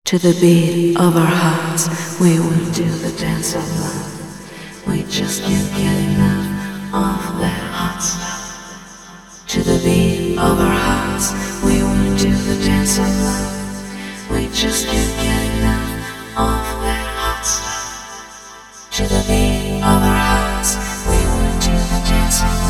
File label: filter loopmorph; prosoniq morph vst